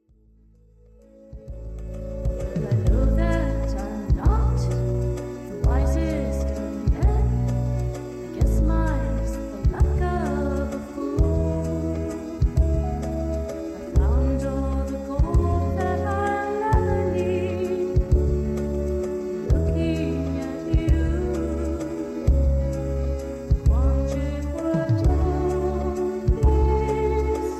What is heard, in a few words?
Folk French Waltz